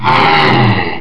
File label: pain; blackhawk; zombie